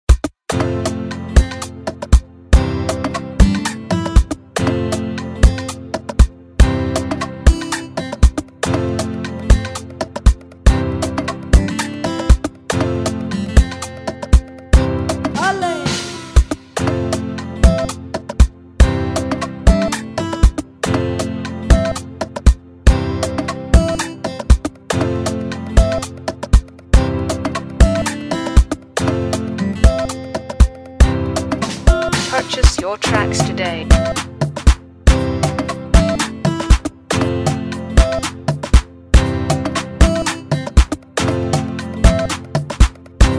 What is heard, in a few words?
Afropop Instrumental